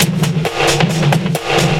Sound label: FX BEAT